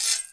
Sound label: Foley Hi; layering